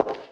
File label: ashioto room big